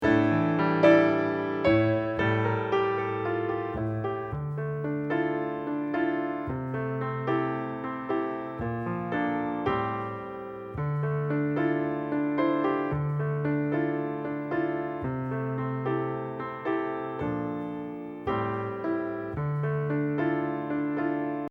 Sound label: accomp